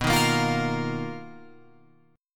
B Major Sharp 11th, Guitar, Open F; BM#11; strummed; JGuitar

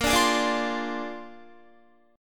Bb 9th Bb down Guitar 4F,4A,5C,5G,5C,6E; strummed

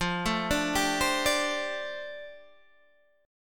F 6th Add 9th F down Guitar Open D, chord

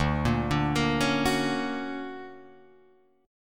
D Augmented Major 7th down Guitar Drop D

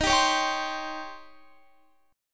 Eb 13th, Guitar; strummed; 4E,4A,5D,5G,5C,6F

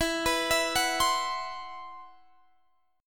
C Major 7th Flat 5th E

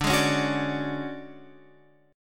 D Major 7th Flat 5th D down Guitar Open F; DM7b5 strummed

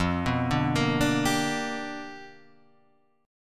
G 7th Sharp 9th F chord

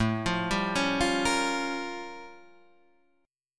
A Augmented 7th Flat 9th A; arpeggiated; 1,3,sharp5,b7,b9; JGuitar

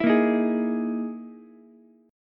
E Major 7th Flat 5th, Ukulele; EM7b5 strummed; JGuitar

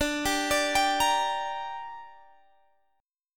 D Suspended 4th Sharp 5th, Guitar, Open G

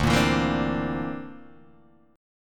D 7th Suspended 2nd Sharp 5th D, Guitar DADGAD

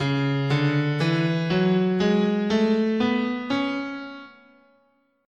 C sharp Phrygian, scale

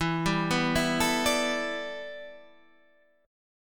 E Minor 11th E down Guitar Standard; Em11 arpeggiated; JGuitar

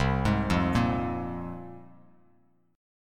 C 7th Suspended 4th Sharp 5th, Bass Standard; C7sus4#5 arpeggiated

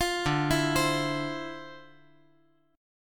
D Minor 9th, down

